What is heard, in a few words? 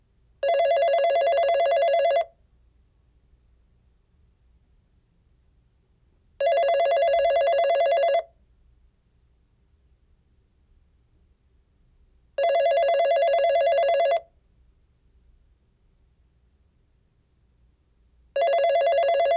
phone